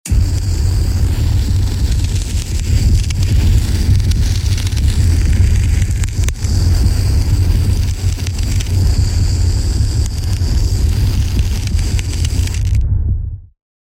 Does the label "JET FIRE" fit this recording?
Yes